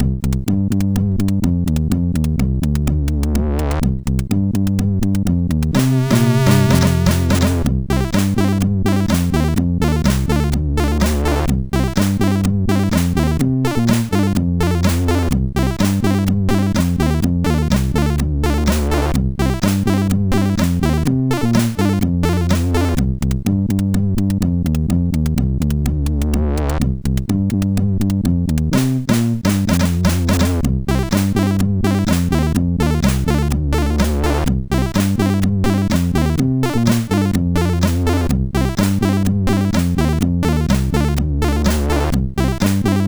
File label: sid, MOS6581R4; Kutvuurpijl